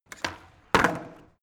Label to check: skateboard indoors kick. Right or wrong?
Right